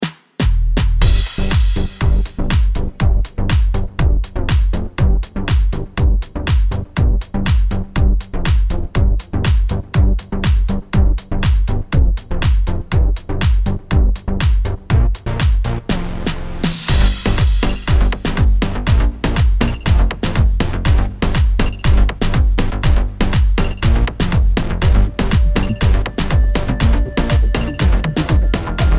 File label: elektro big room